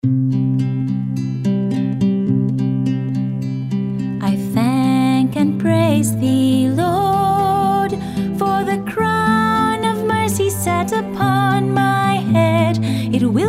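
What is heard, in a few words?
Song, English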